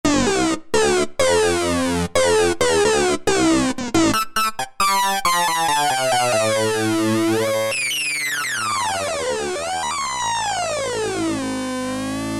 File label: Lead Sync, Alesis Micron; Factory Programs; Cars Sync